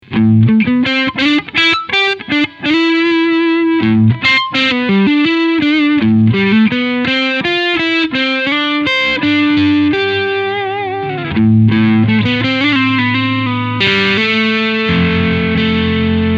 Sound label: Medium Gain